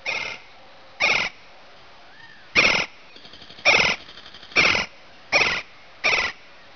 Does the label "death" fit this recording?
No